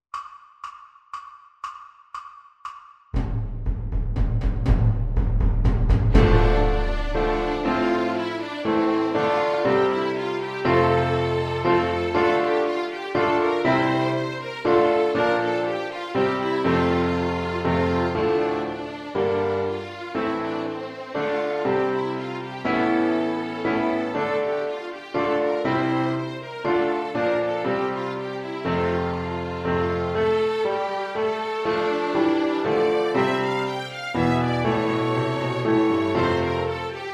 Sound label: Violin Piano Backing Track; Basse Dance; ViolinSchool